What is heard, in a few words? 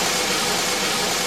normal slider slide; argon